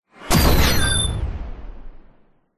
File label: science fiction gunshot with electronic beep; zapsplat